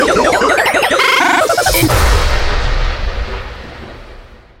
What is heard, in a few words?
wrench teleport; drg